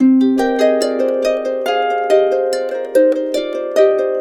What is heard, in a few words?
140, Complete Harps; USB Soundscan vol.62; AKAI; WATER 140